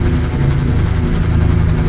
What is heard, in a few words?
Machine Generator Loop; SFX Kit; Machinery - Generators